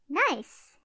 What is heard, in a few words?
correct